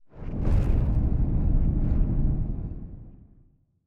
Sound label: city planet; Stellaris; machineage; planets